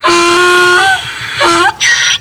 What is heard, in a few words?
Donkey courier spawn